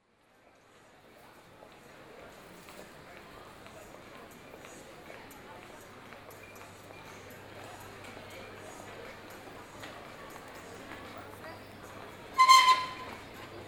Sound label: london central station